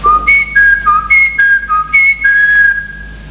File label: SIGNAL; 7eme compagnie